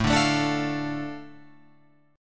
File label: A Suspended 4th Sharp 5th, Guitar Open F chord; Asus4#5 strummed; JGuitar